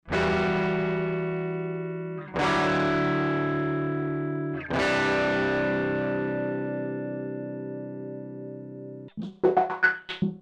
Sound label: guitar